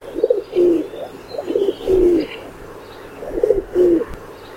kesykyyhky; pulu; Kaupungit

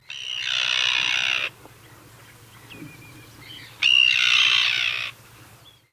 lapintiira

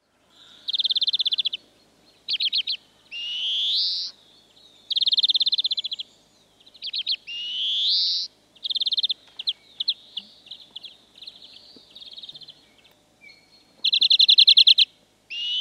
viherpeippo

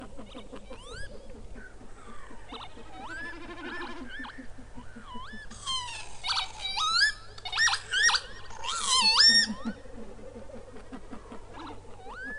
toyhtohyyppa

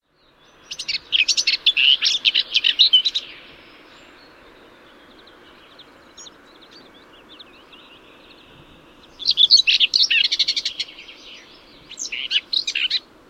pensaskerttu

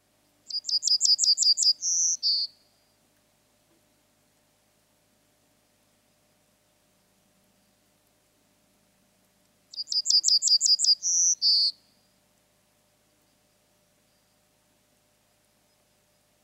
keltasirkku

